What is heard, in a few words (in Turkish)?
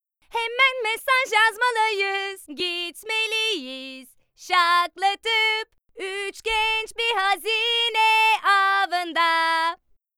Şarkı